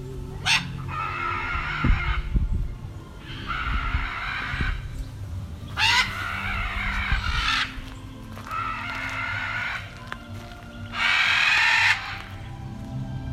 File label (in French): Ara; Aras; Zoo de Bordeaux Pessac; psittacidés; perroquets